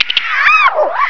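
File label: squeak deploy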